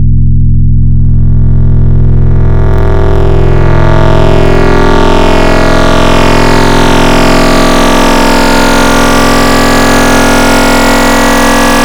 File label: VCos Tri Mod Asym, Serum Wavetables; Synthetic's Serum Collection; Cymatics - Nuclear for Xfer Serum Wavetables